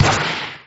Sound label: kick shoot